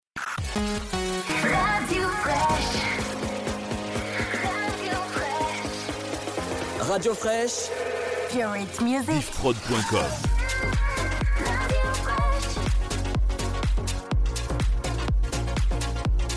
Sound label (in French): Rampe; Spinnin